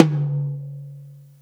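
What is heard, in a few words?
TOM soft; Zero-G; Chemical Beats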